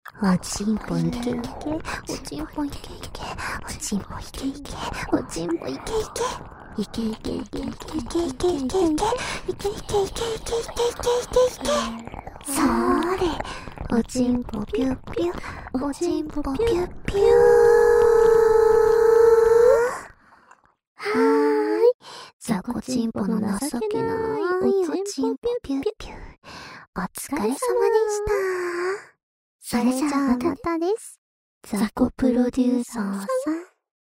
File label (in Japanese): 射精しちゃえ～～♪＋ぐぽぐぽW耳舐め; 元恋人アイドルに馬鹿にされたい！; 由紀＆華恋; おまけループ（オナサポ）